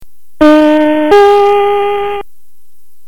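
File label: signal, subway announcers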